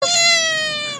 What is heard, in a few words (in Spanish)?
cacatua